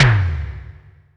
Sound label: U220 REVE TOM; Greytsounds; Scott Peers Sound Engineering